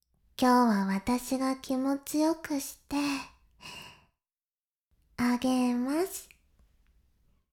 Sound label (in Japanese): しこしこ音声, ASMR; 白くて黒い魔性円環